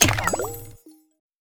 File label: Collectable Reveal